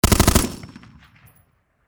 Warfare Machine Gun 7.62 Caliber Springfield M-14 Short Burst Close Perspective